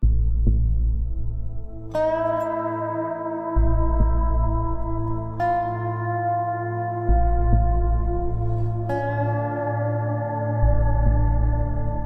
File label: Interlude Ambient; Foundry; FoundryVTT; sr5 Dawn Of The Artefact; Ambiance; NAO